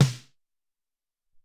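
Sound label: Deep Snare, Alesis SR-18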